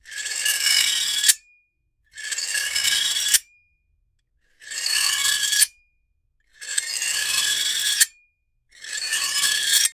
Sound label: rotate a heavy metal, cranking